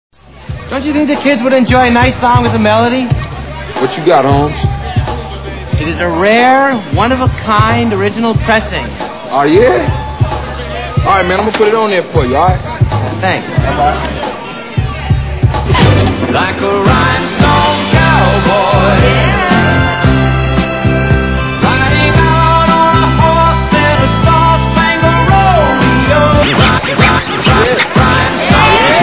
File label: scratch